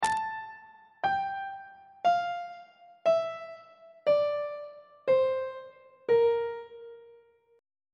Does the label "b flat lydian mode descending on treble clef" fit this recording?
Yes